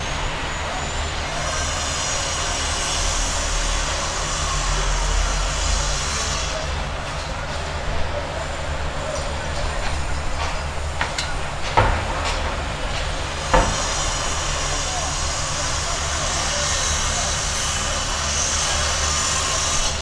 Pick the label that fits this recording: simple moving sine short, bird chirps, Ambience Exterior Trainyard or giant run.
Ambience Exterior Trainyard